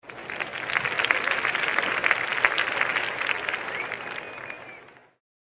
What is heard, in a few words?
Crowd applause in a theatre